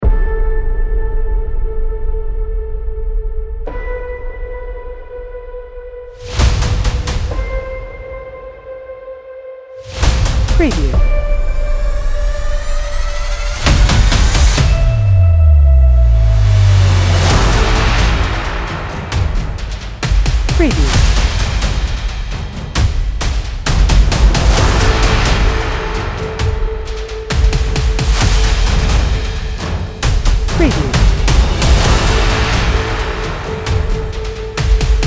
Thunderous Drums, Cinematic Powerful Drums Dark Action Hybrid Countdown